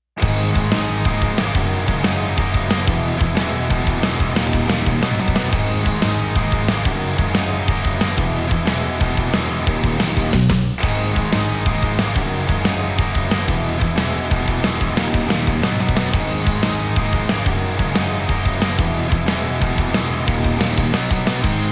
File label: Hard Rock Guitars Off